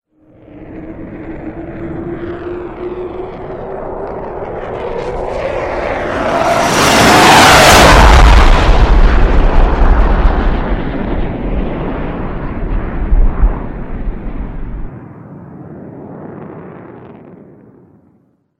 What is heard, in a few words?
Jet Flyby